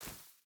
footstep barefoot grass run; Rust

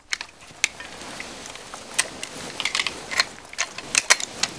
pit sitting idle foley; trainer; Call of Duty Modern Warfare

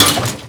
metal scrap impact

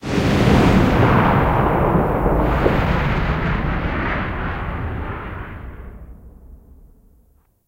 rocket tail; guns; tanks; War Thunder

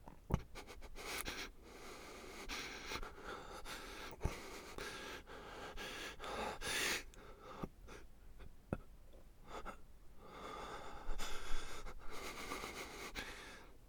breath hurt; Escape from Tarkov

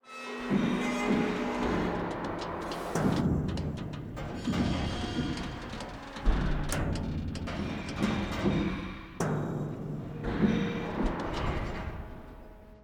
ambient port; World Of Warships; clanbase

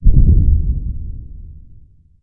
Amb Destruction

